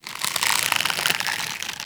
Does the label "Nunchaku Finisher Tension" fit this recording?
Yes